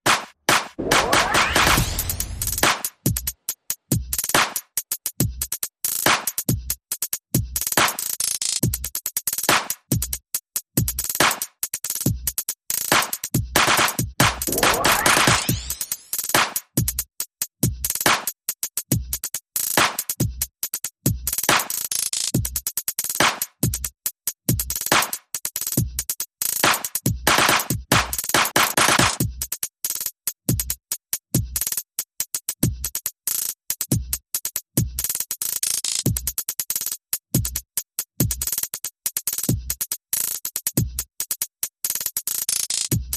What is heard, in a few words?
Perc and FX Only